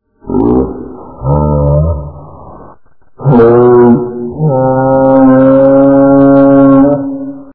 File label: train cant stop